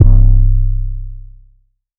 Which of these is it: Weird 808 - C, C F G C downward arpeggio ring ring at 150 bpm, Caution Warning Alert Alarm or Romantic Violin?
Weird 808 - C